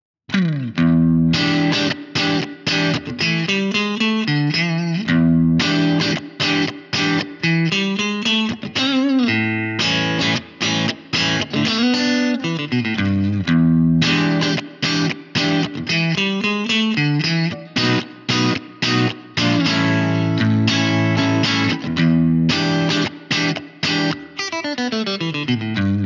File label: breakup; jensentone